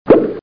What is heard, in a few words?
short pop